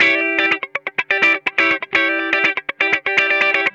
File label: E PEDAL, Funk Guitar; 90 s Sample CDs; Zero G